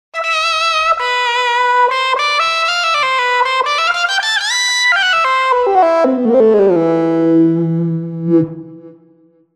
Analog; TURBO VL

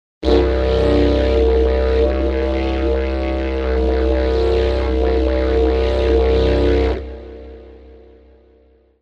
Digirdoo; TURBO VL

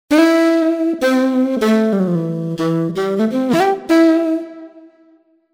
Lead

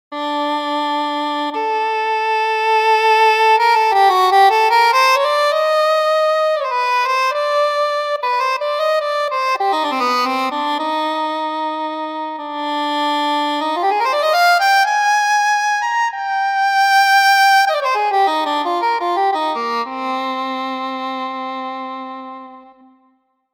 English Horn; Kontakt Wind Paks

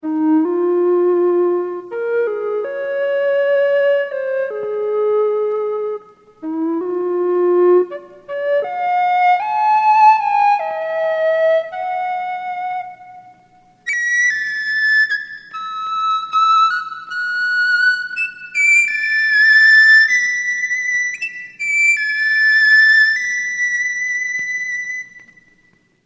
Flute

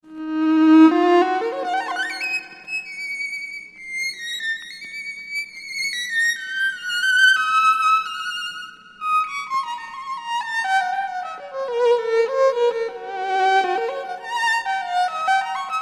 Violin

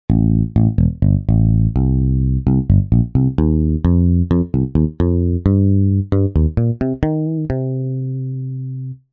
Pick Bass; TURBO VL

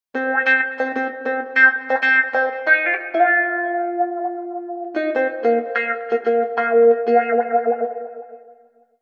Wah Gt; TURBO VL

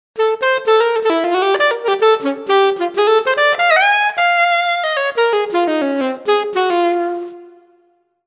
bird alto; TURBO VL

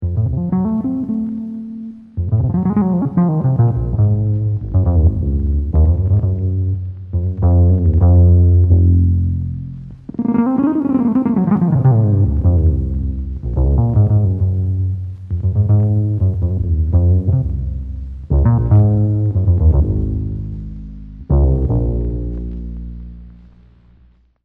A Bass DX7

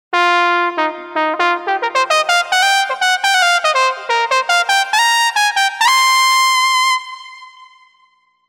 trumpet; TURBO VL

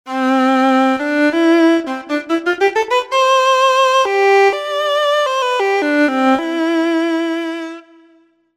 Rosin Vla; TURBO VL

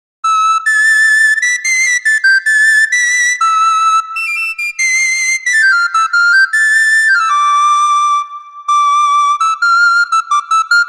Recorder Sopranino; Kontakt Wind Paks

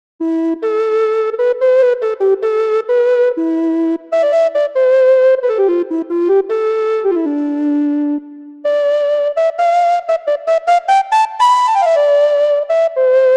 Recorder Bass; Kontakt Wind Paks

